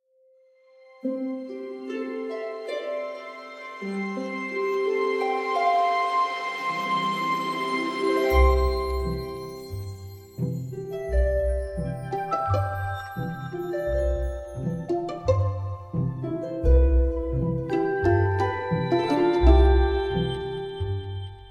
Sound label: Soft Dance